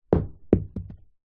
leisure ball small hard drop on internal carpet floor